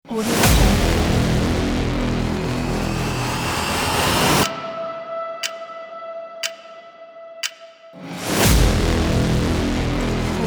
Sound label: epic trailer logo pack